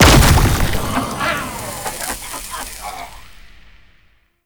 Shroom Proj Explode; Warframe; Nokko; Gyromitra

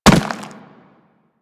Infested Runner Step Default; Warframe

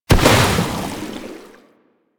Bubblegun Pistol End Explosion; Warframe; Weapons; Tenno

